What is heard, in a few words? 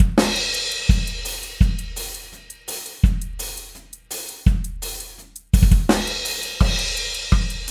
STEM DRUMS; multitracks